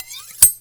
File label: Polish Kitchen Knife